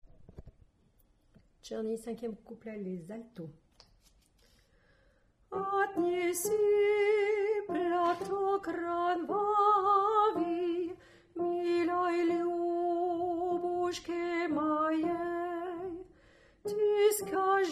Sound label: Alto